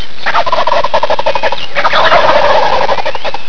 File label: gobbles, Turkey Sounds